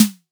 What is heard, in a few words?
snare D4, drum machines